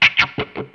Wah Lick 83; Mo' Phatt; Digital Sound Factory Mo' Phatt

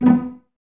CES B 3 Pizz, Cellos, String Ensembles; Miroslav Vitous

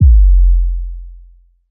bass 808 smoov F; Polyend; ARC Noise

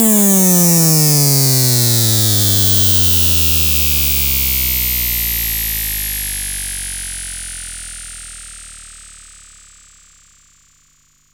SYNTH FX

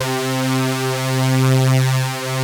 POLY SYNTH; Wizoo - Powered Wave

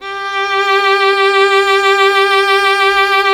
violin; Miroslav Vitous; Solo Instruments VOL-2; Solo lnst. II

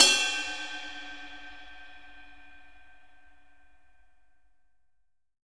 Room Ride, Room Drums; Akai MPC500

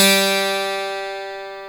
12 STR G3, PIANO; AKAI S-Series CD-ROM Sound Library VOL-8

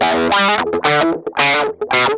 GUI, LICKS; 110 LICKS

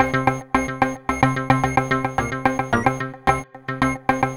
philter (seq)

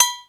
AGOG L, DRUMS; East West; Global Sounds

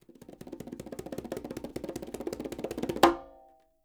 Quinto, Percussion

